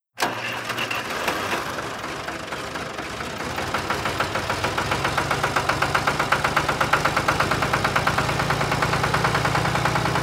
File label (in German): Traktor